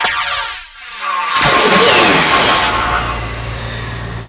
pod binder start

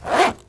ZIPPER; TOOLS ETC; AKAI; 1000 Sound Effects

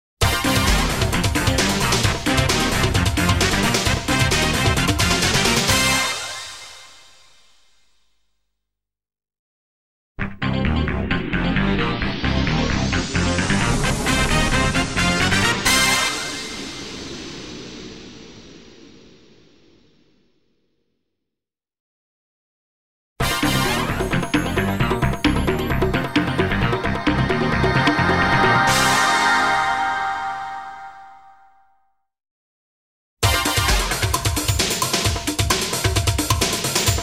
Splash Cut; Sounders, Bumpers, CUES & IDENTS; DennisMusicLibrary

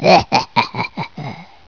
HAHA; Nare Gewoontes

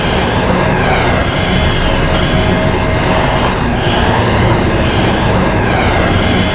pod steady hi loop

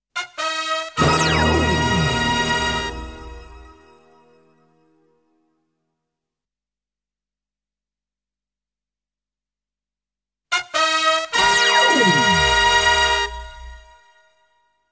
Power Fanfare; AV Music Waltham; Jingle Tracks; DennisMusicLibrary

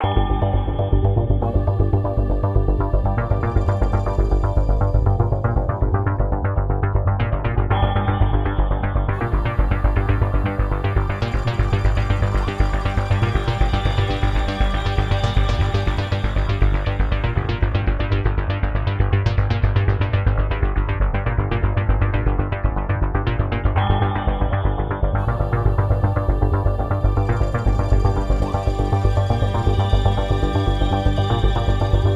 Calling winner tension; Rosegarden

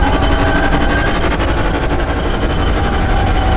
pod chop combo loop

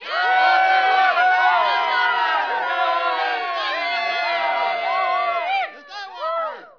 crowd cheer sky

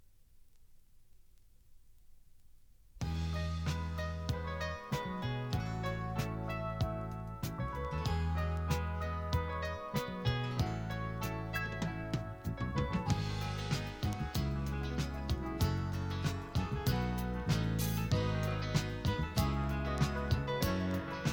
topformat maxi jingle single

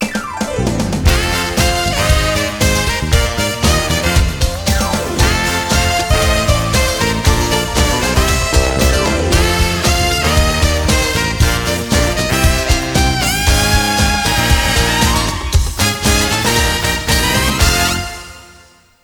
JACKPOT WINNER; Rosegarden; Z-TV

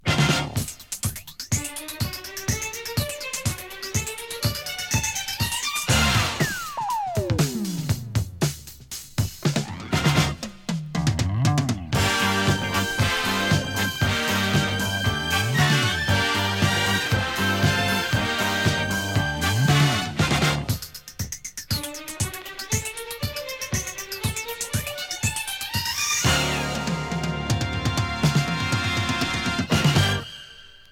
topformat maxi jingle single

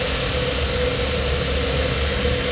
steam loop

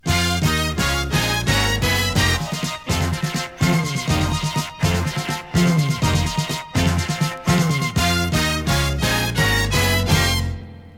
topformat maxi jingle single

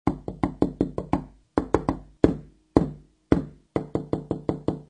door knocks; sound effect; Deuren

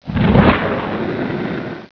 FIREBAL; Vuur

